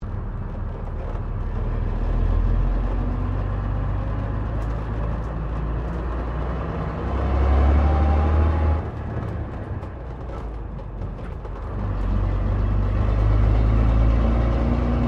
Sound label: Bus Double Decker Bristol VR Interior Driving